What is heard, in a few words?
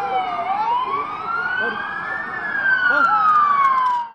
siren